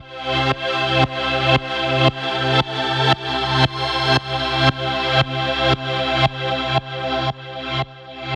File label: Sidechainer